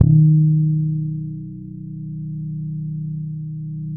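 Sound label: D#3, fretless harmonics, bass; Spectrasonics; Bass Legends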